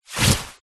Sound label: foley clothes running shorts polyester single leg move walk movement